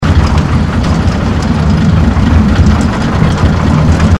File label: tank flame idle; nod